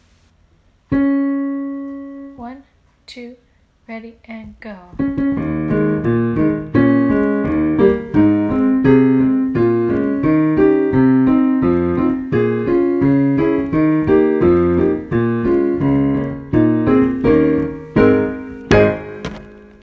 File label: Accompaniment; Mrs. Paroo